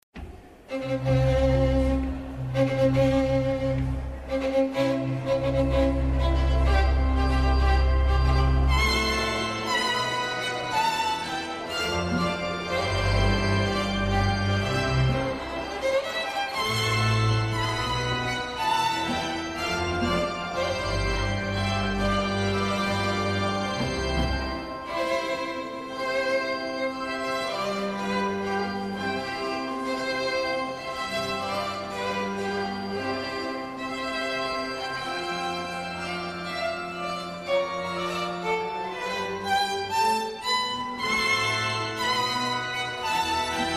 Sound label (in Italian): Marcia Nuziale (violino organo); Mendelssohn